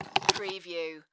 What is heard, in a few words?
Coffee Maker Filter